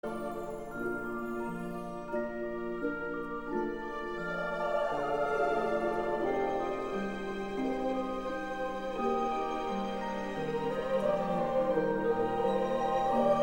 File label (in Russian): novogodnyaya melodiya skazochnaya